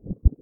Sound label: Haptic-Lever-Reverse